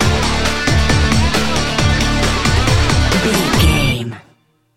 jagged edge stinger, Sports Rock, Pop Rock, Rock, Hard Rock, Metal; Sports, Sports Rock, Pop Rock, NFL, Football, Sports Music, Rock, Hard Rock, Metal, Driving, Bikers